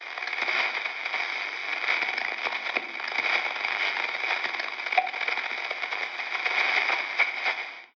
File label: Radio Music; Radio Music Bank